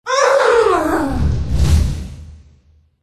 elf death; boss

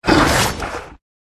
Two headed Dog Dodge

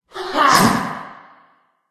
mage boss attack voice bottom

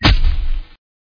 crit weapon

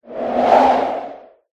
dog buff blue

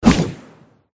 skolopendra; buff in

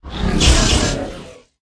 jelly fish boss attack voice up

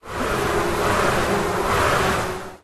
stun

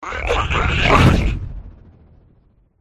iguaron pet buff; iguaron4

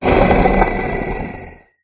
stun; yasher

